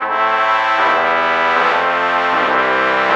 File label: trumpet trumpet lpc true chord morph